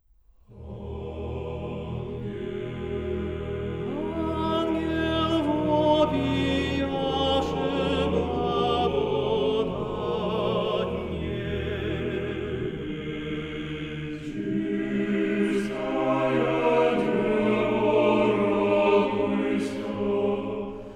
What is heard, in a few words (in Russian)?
Хор Валаамского монастыря